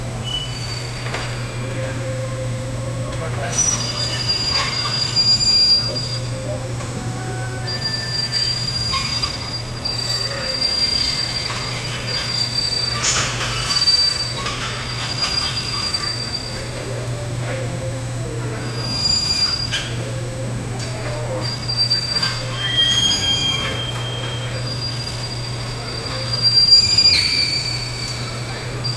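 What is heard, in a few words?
the ambience of a bustling store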